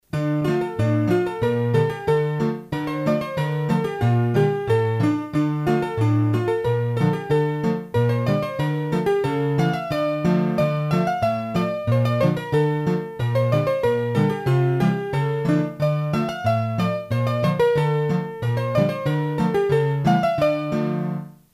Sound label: Scottische, hurdy-gurdy; Massif Central